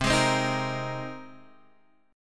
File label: C Minor 7th Sharp 5th C